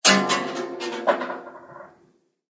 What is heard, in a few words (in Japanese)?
お賽銭